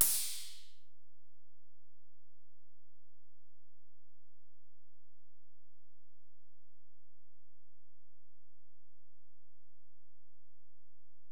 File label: EPerc, Alesis DM-PRO; 300 Drum Machines